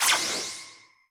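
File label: Sound Effect System Footer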